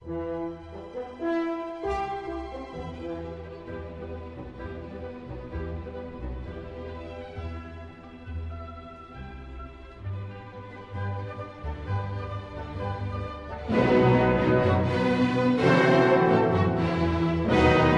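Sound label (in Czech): Symfonie č. 9 e moll, Adagio - Allegro molto, Orchestr; 1893, 1. věta; Antonín Dvořák; "Z nového světa"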